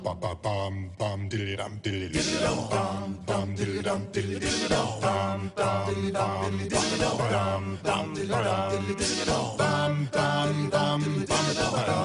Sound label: Acappela